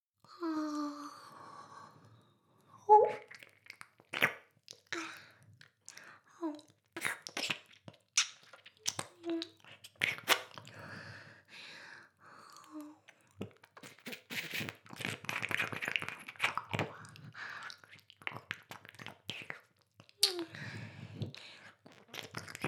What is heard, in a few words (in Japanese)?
耳舐め; 全妹計画２; メスガキ妹; 全妹計画<オール・シスターズ> 2妹め